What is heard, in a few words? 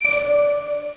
joong, spacey